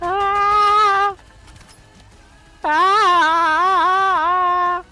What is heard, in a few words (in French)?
chante